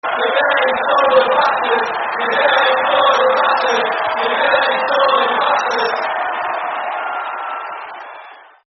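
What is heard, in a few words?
Dirty Southern No Cheer, football terrace chant; Bolton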